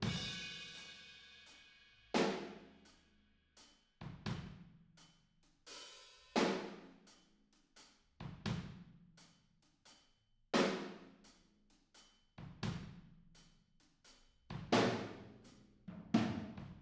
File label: Verse, Room, Drums; Sonic Foundry (Sony Creative Software); Drums from the Big Room The Mixes; Demo Projects